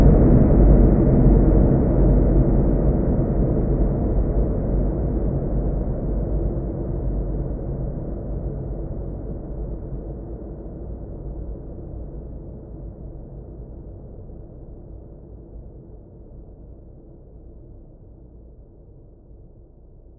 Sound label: Long Reverby Fade